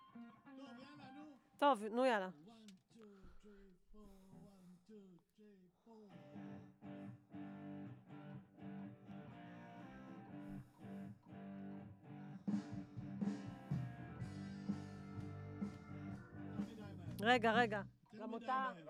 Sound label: VOC GUIDE